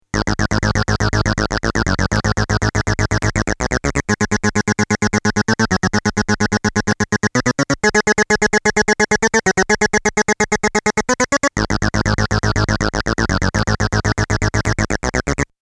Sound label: synth